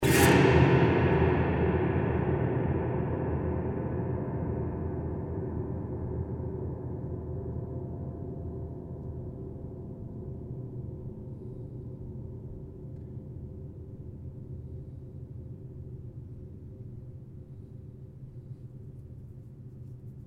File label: Long Decay, Piano